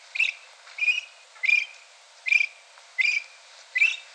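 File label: Anthropoides virgo juvenile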